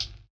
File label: drumbreak hihat